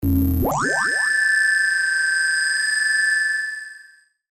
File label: Scanner Beam Ray, Scifi